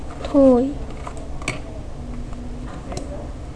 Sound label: toy